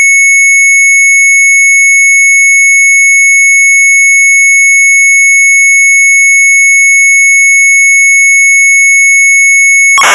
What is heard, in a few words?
MZ800 Tape, sharp; DEC BINAIR; retromelsarchive